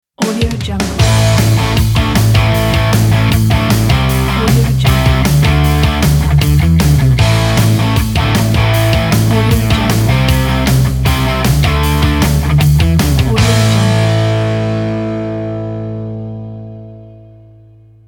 rock opener logo

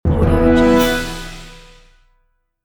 epic orchestral level up

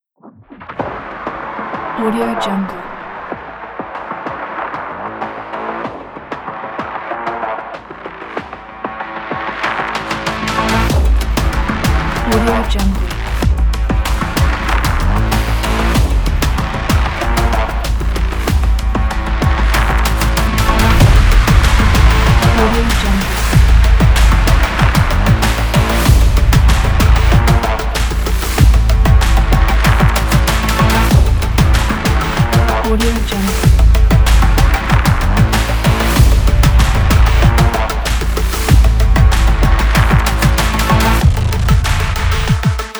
cyberpunk

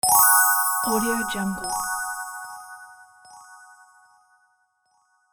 magic appear

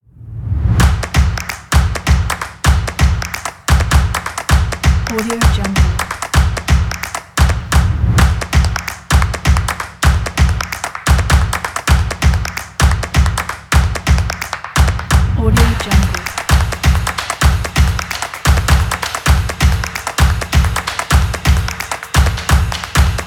percussion

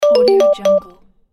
ring bell

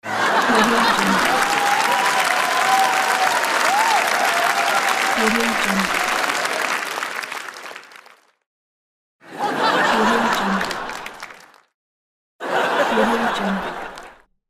crowd laughs and applauding

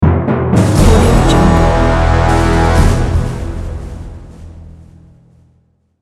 thundering logo

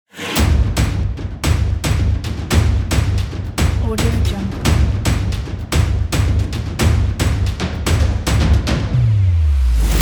drums percussion

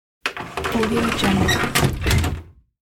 wooden door bifold

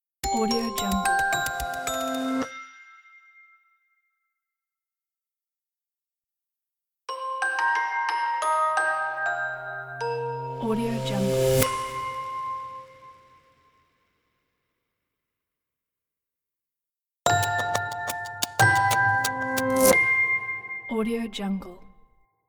bright corporate logo pack

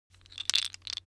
object table game tiles wood rattle

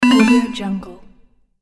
computer gaming fail

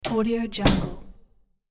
door close wood; hollywoodedge

